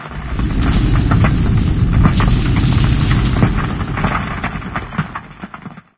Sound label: terrain rumble, ambient atmosphere; video games; source engine; portal2